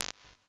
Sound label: Quick Short Room; Ambience and Small Rooms; Ableton; Convolution Reverb